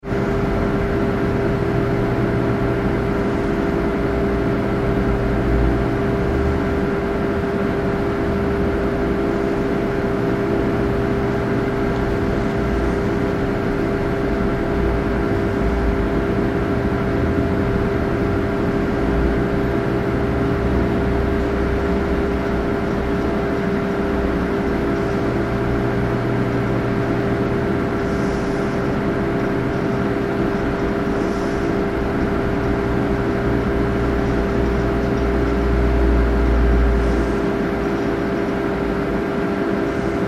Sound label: Computer Room Atmosphere, Computer room 12 machines running